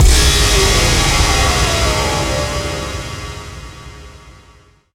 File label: stinger; Mirror's Edge (2008)